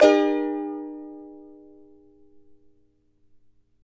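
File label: CHARANGO, A minor, up; Spectrasonics; Hans Zimmer Guitars VOL-2; CHARANGO CHD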